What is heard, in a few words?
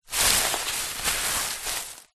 foley plastic carrier bag movement